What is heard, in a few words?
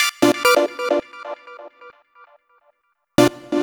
Lead Synth